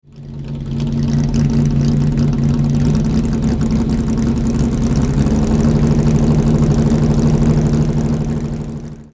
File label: 1973 Ferrari Daytona, engine